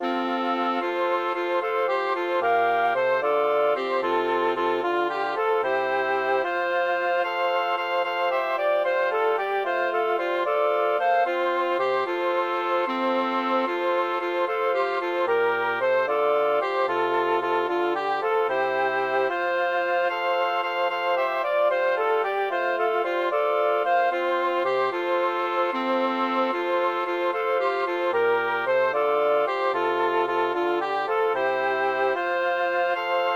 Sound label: for woodwind quartet; ravenscroft thomas; animationem